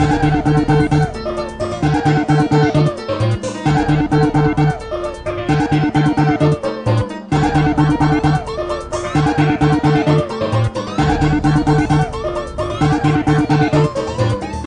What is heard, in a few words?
rave to dub